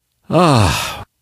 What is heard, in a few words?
sigh; Portal 2; Source Engine; video games